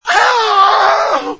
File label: premium, doorbell